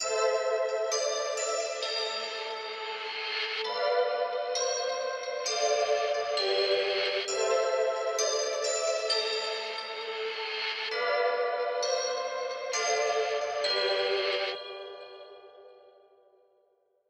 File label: 132 bpm A Minor, sinister music loops; Salems Lot